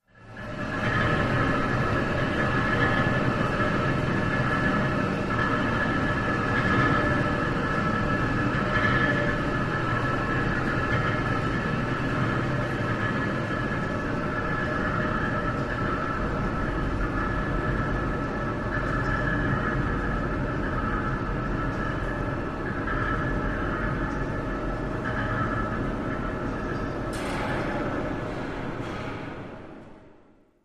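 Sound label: Industrial Machine Heavy, factory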